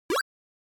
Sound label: Action Throw